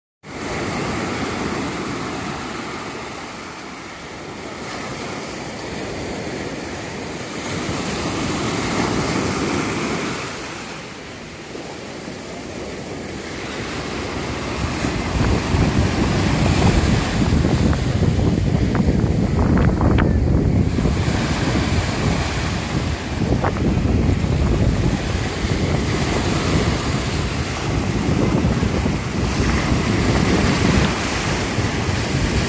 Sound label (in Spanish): Playa; Aguamarga; dia playa; sensaciones